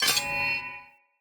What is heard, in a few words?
Curved Sword Whoosh Accent Heavy Start; Weapons; Player; Swords; Sinecure